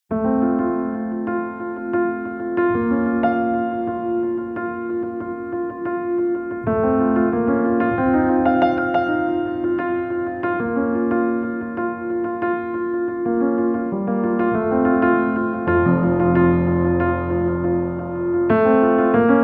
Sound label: Clavia Nord Grand Amber Upright